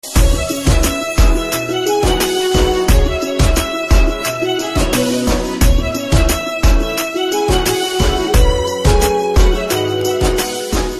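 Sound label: background music Ringtone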